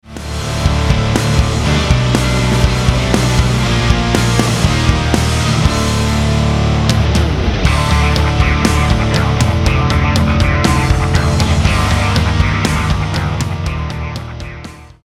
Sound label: tempo contrast